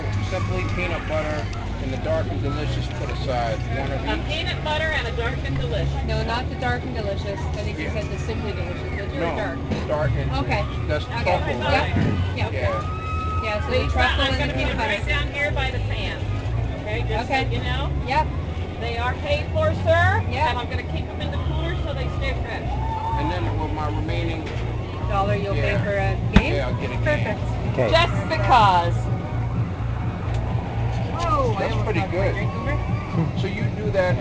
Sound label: soundscape carnival booth action; Merriton Lions